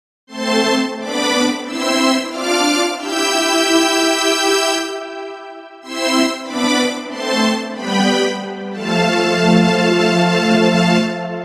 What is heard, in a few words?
Strange Orchestra